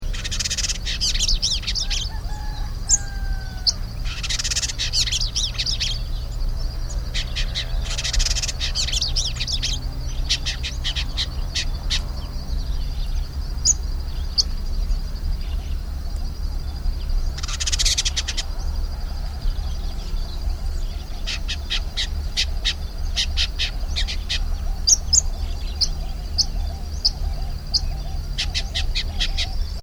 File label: Vidua raricola; Indigobird Song